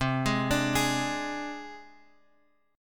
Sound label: C Suspended 2nd Sharp 5th C Guitar Open G; down; chord shape